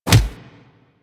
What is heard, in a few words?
Melee Impact Punch; Enemies; Purgatory Warrior; Warframe